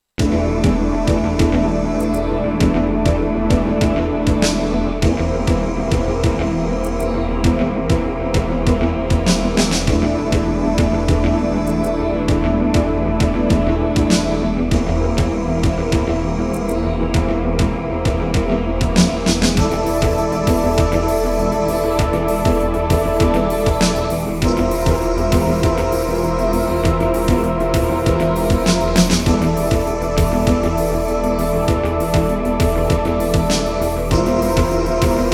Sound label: Yamaha XG SoftSynth S-YXG50 software; soundcardrecordings; Abuse